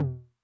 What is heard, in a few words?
HI TOM, Med Tune Med Decay, 909; classic machines; HT - HI TOM